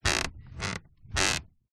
household mattress movement creak

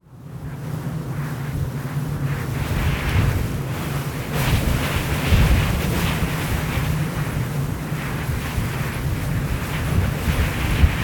nature wind blowing through dry grass field; felix blume

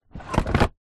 vehicles scooter moped seat drop down

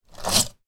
household toilet brush take out of holder

